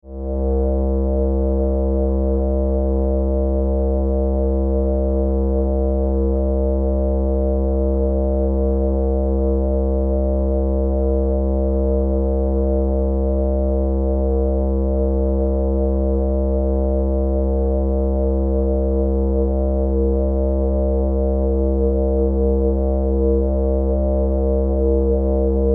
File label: drone